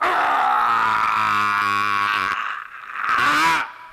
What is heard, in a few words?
scream male long; ooh ahh